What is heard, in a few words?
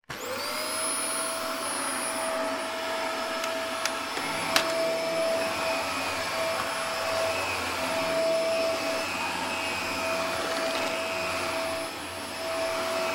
household dyson upright vacuum cleaner switch on operate and off